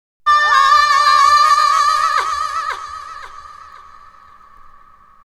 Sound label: VOX SUNG; Megabass Remix! 2